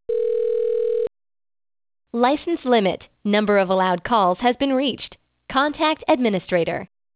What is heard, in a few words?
delay 7 sec, no channels message